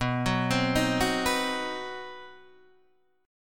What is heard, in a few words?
C Minor Major 7th Flat 5th B, chord